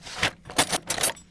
toolbox; snds jack gmod

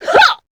succubus shout; queen girl

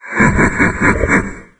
taunt; doom3; sabaoth

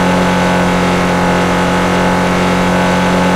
simulated vehicles mid

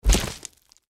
deathclaw foot; npc

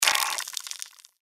mirelurk injured; npc

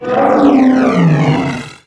sight, doom3 pinky

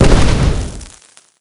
imp exp; doom3

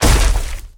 step run

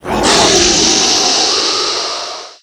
attack

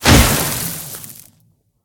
impact ground lite

